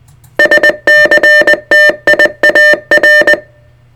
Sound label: SCTIAR 600hz 20WPM